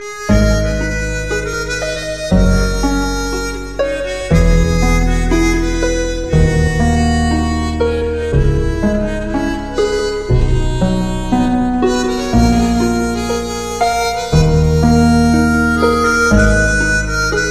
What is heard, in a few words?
Harmonica